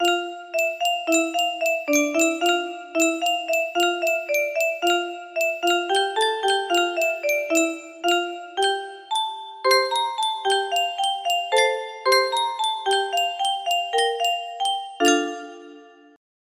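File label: violin concerto, music box melody; sibelius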